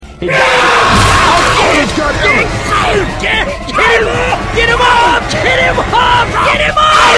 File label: ugha